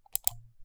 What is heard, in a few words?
mouse click plastic